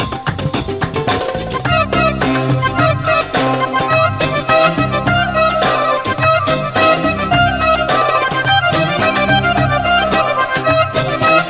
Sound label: Irkosily Beledi Sample, belly dance